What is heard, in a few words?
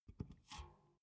big can filled put down soft